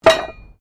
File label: METAL SPIKE FALL; Materials